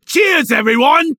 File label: Bar Cheering when drinking